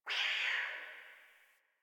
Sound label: Distant Cat Screech